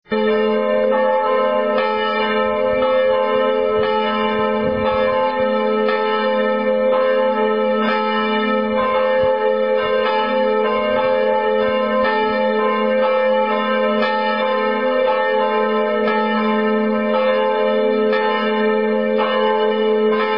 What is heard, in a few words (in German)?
Glocken